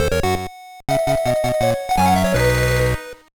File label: Lose Life; Magical Quest Starring Mickey and Minnie; GBA